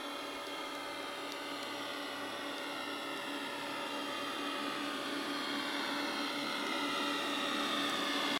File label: boat noise; Rycyk